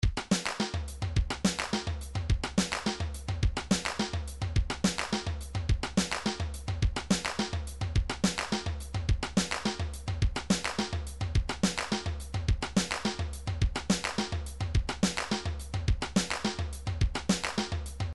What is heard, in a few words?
106 tambourine